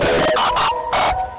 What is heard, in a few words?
android notification bass boosted